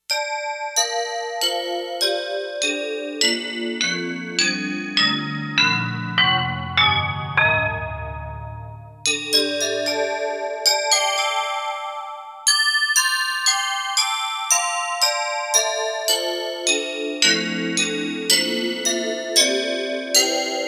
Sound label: BELL, Roland JX-1; Factory presets